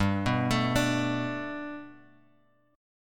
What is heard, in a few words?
G Major Add 9th G down Guitar Open G